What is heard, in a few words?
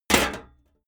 sheet clank damped; hits impacts